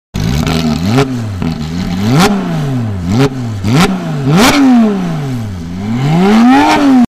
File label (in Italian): rombo porsche 911